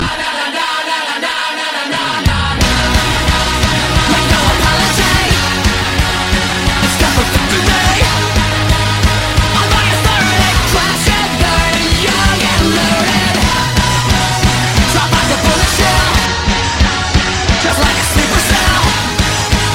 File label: Sms Nanana